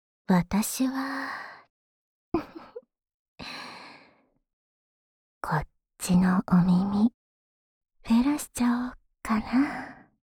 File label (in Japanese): 妖艶 ねっとり右耳フェラ, ASMR; フェラ素材